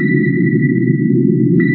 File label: submarine